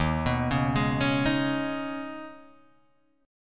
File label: D sharp 7th Suspended 4th Sharp 5th, Guitar, Half Step Down